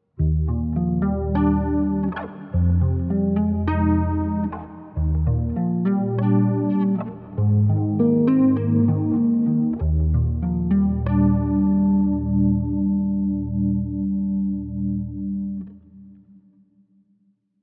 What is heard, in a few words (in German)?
Quad Cortex bass chords mit Chorus